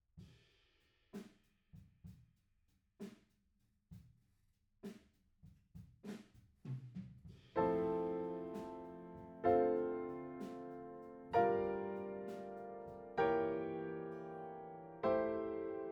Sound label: Piano B